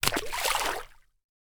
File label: water splash small stick lake